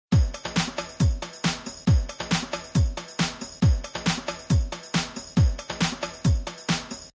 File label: drum loop